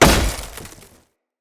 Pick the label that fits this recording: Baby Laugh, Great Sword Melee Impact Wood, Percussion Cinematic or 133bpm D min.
Great Sword Melee Impact Wood